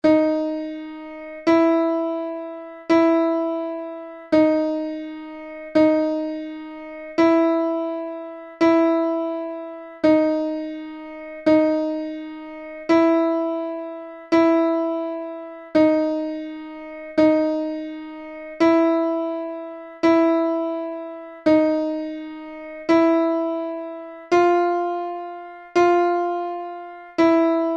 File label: Chromatic Trainer A, bansuri